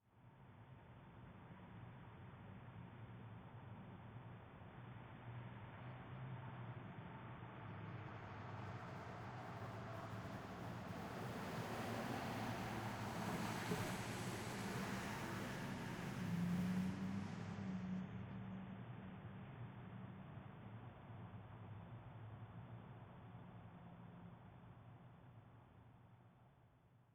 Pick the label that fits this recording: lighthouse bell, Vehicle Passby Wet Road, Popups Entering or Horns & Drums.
Vehicle Passby Wet Road